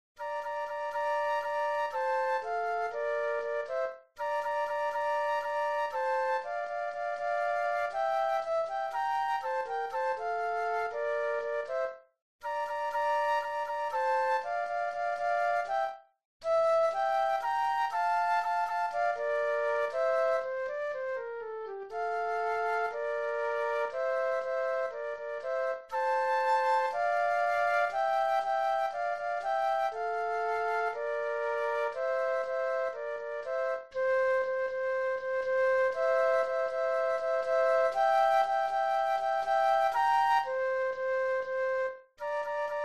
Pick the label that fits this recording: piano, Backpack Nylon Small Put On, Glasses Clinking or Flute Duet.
Flute Duet